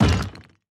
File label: car stone impact; factorio 2.0.55